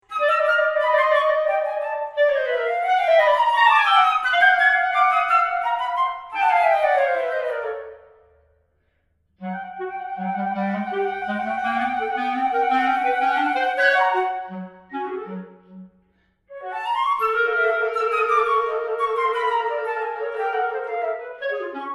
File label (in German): Walzer; Sequences